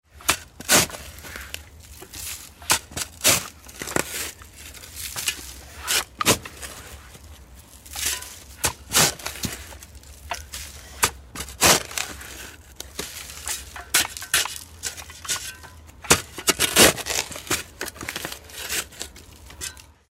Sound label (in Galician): enterrar